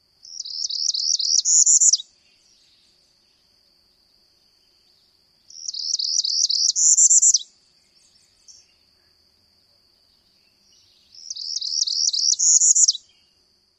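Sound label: dawn song, northern parula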